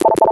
door beep; dm subchamber

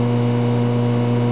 lamp, ambience

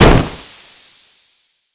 crash

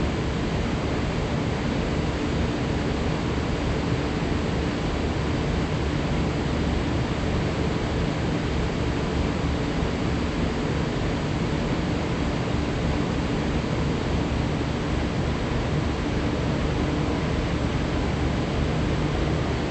ambience industrial

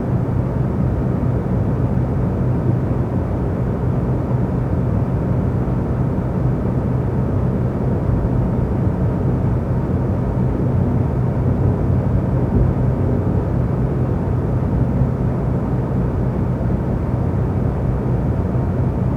ambience vent

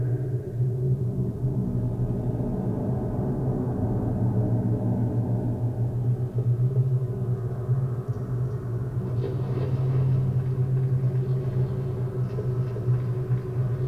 lightroomamb

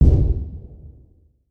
mp5 single far

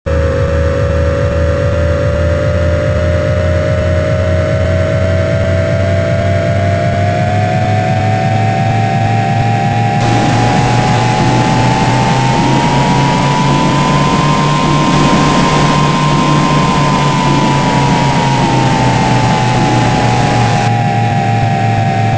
machine powerup

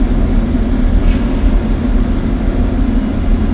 interior

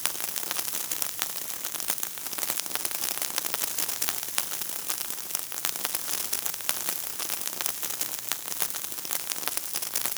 ambience disaster fizz

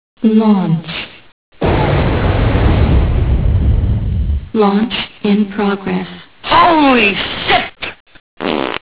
launch fart

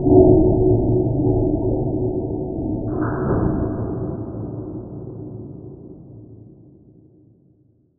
misc amb

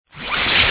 shock deny

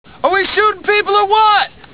shoot

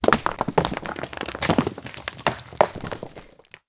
rocks fall

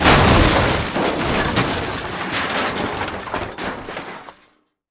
break

